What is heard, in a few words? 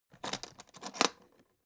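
aluminium case small open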